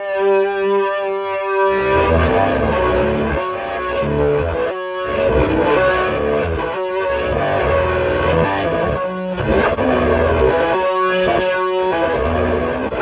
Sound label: Scrum Long, Electric Guitar; Sonic Foundry (Sony Creative Software); Friendly Fire; Wrath